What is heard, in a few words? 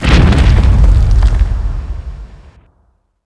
damage debris